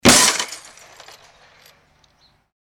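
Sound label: glass break crash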